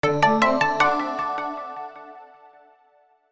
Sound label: multimedia logo; sound effects one